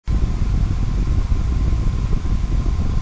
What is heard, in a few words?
b fan; desktop pc